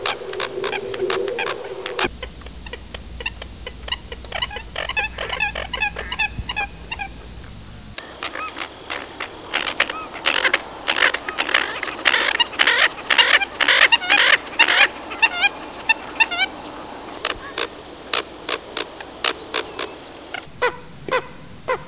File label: coot